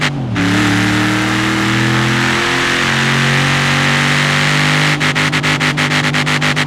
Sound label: third, vehicles